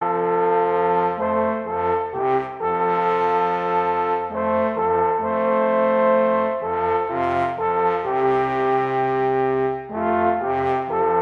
alphorn music

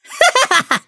Giggle

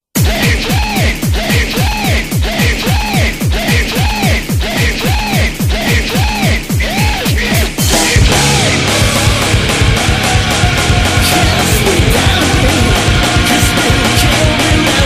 Metal; Baki

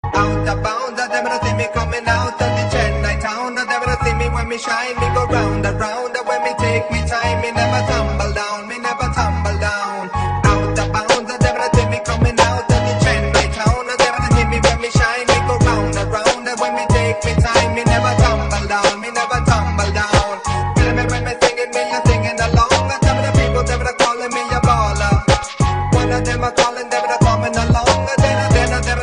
Tamil Rap

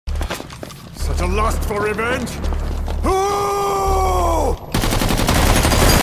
WHOOOO

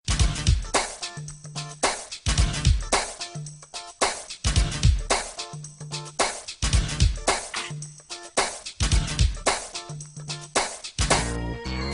Beat

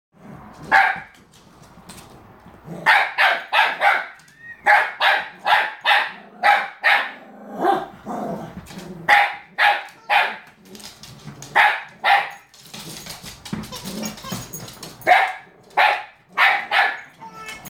dogs barking

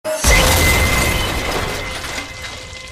msg glass

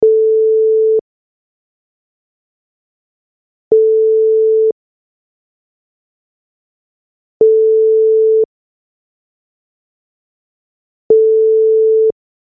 Viber ringing

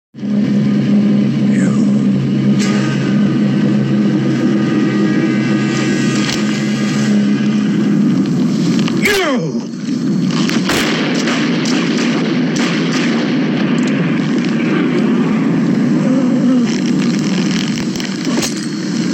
revolver

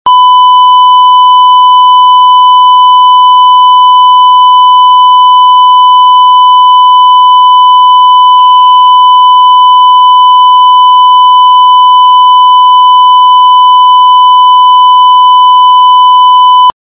Hz

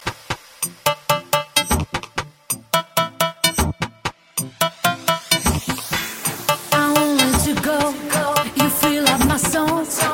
House Mix

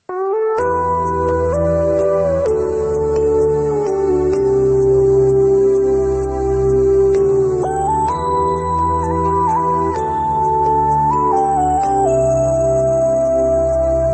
Thai Music

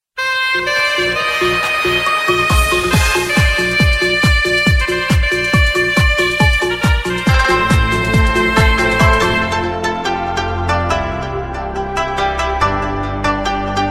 Sweet Instrumental

